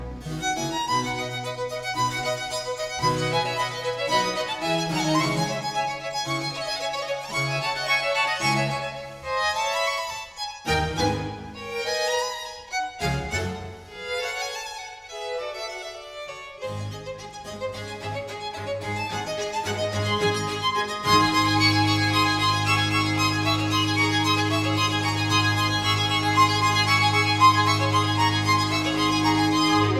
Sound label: Concerto for 3 violins; Telemann